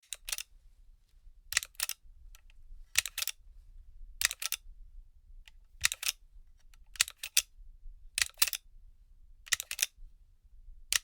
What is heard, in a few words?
magazine handling